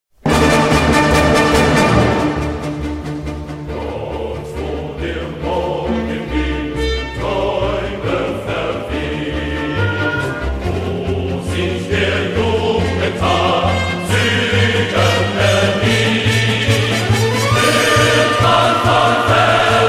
Military March; German Democratic Republic

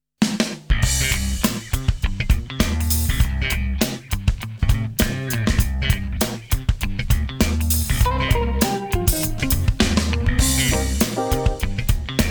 Jazzy Groove

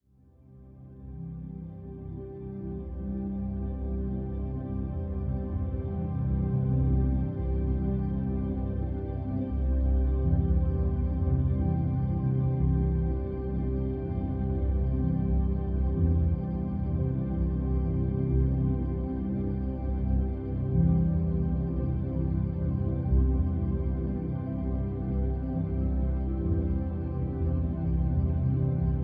Deep Sleep Relaxing Music Yoga, Soundscapes

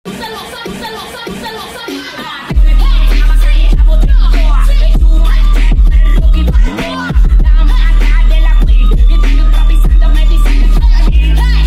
BassBoost

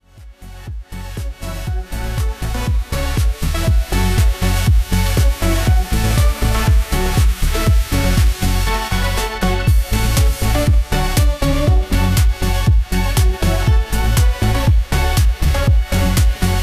Synth

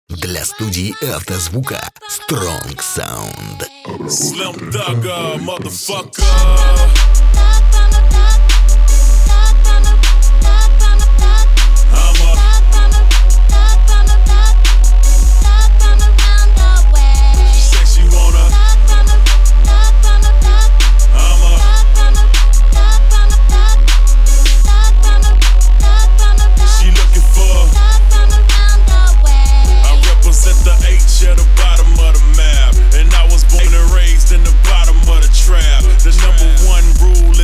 41-46Hz Low Bass

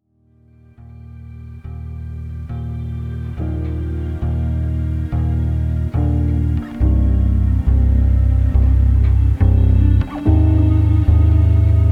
Lofi Chill

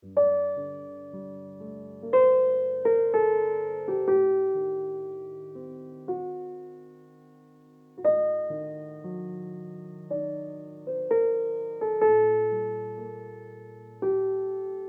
Piano, Adagio in G Minor

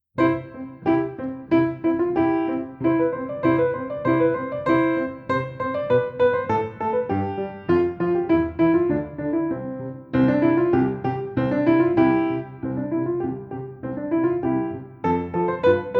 Musette

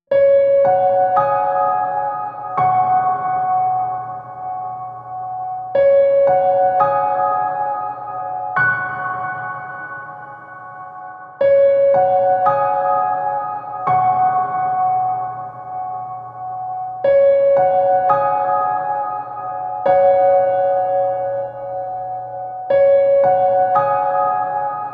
Beautiful Groove Drops

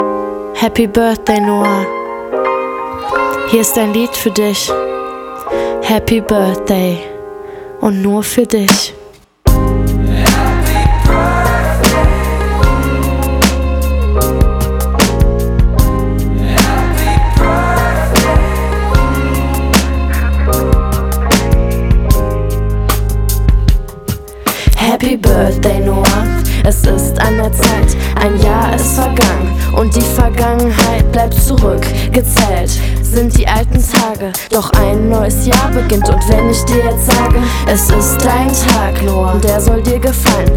Hip Hop Birthday

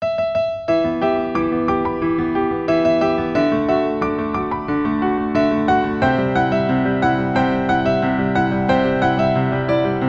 Acoustic Piano Version

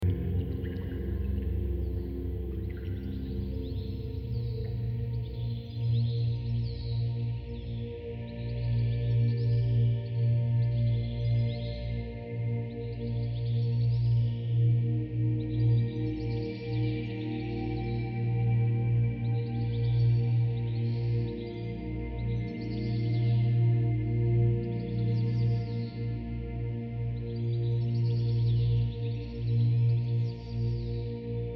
White Noise

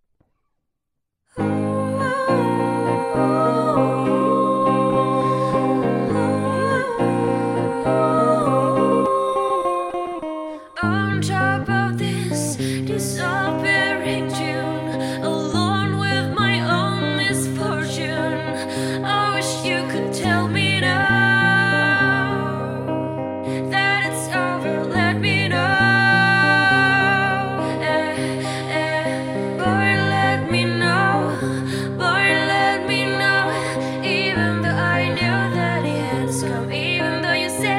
Acoustic English Cover

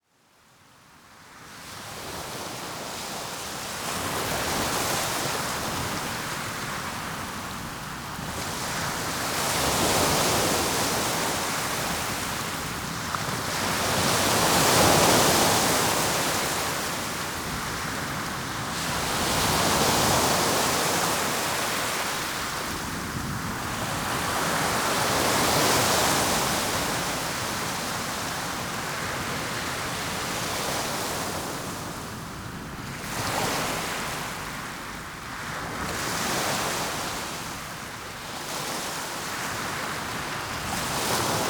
Ocean Sounds Nature Sounds

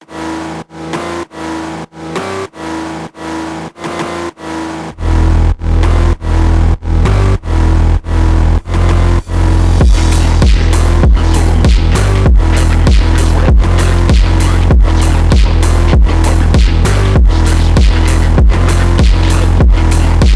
FONK

